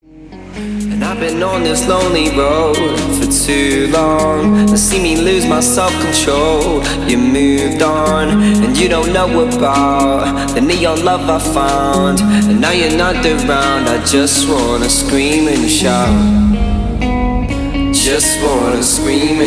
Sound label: Extended Mix